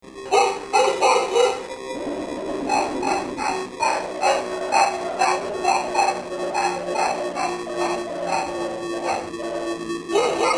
dogs

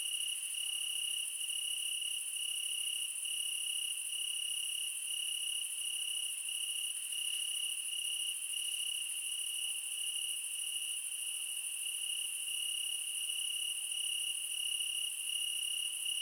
meadow night crickets ambient

